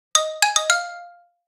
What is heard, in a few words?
Xylo Hello, notifications; Yota Yotaphone-2